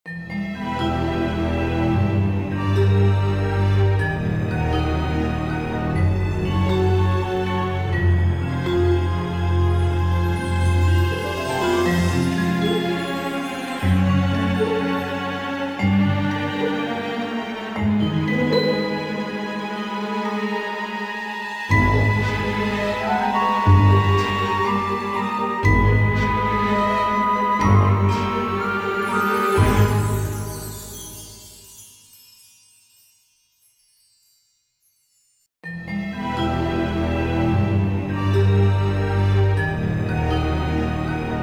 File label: Catacombs Bg Scared